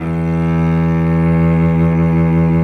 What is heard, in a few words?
E1 SOLO CE, SOLO CELLO